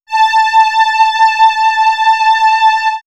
Swirls String Vox, pads; X-Static Goldmine

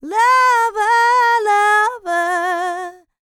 LICKS, DANCE; R&B & Dance; ILIO; Vocal Planet

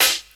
808 SD, DRUMS; Crypton - DrumBase5000; CD DRUMS #2

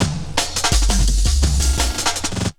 Essential beats

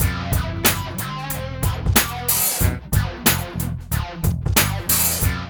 MIX, Funky Ass Loops; East West

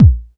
KICK, BASSDRUM; Drum Hits

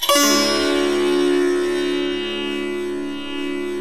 TAM SIT SAR

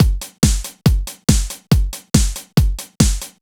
Kick Loop, 140bpm

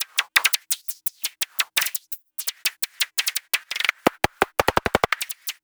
perc lifeforms noisehat loop; Polyend; Recue; percs glitches and layers

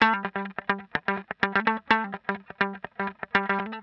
125 X wah A, wahwah; PowerFX - Nu Jazz House

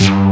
TRON BASS; Pro-Rec - Synthsonic; TRINITY

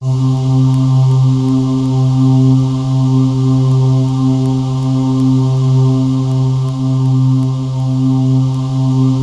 C2, Synth Leads

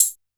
F#3 Tambourine, Yamaha PSR-E323 Standard Kit

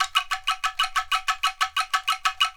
ANKLUNG; ILIO; Synclavier Percussion 2; World & Orchestral

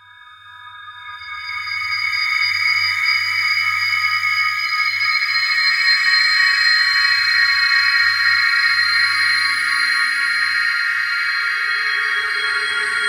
PSYCHIC, Ominous Drones; USB Soundscan vol.48; AKAI

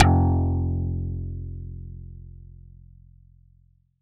BAS F1, Yamaha TX7 synth bass

